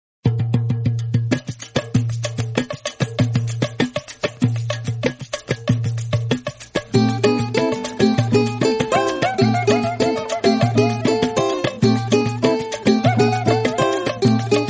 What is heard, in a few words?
samba brasil